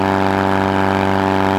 Loop, Engine, buggy

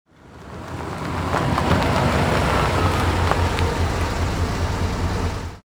car pullup, vehicles; Soldier Of Fortune II Double Helix; XBOX; ambience

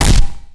character hit; projectiles; melee hit; Max Payne

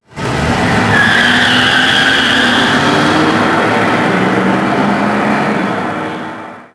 car start; Max Payne; tenements

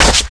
bail scrape; skater; Tony Hawk's Project 8; XBOX

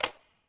bad walk, droids robots

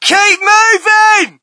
crowd, shout; Mike Tyson Heavyweight Boxing

